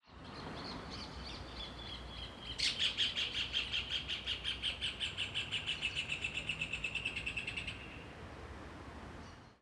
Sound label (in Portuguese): Vocalizacao Joao de Barro em Estacionamento; aves; canais surround 5 1; da UnB